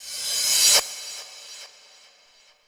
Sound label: normal hitfinish